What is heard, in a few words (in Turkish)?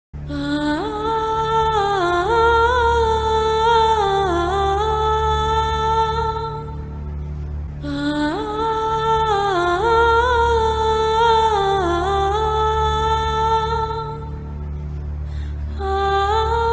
Jenerik Vocal; Fazilet Hanım Ve Kızları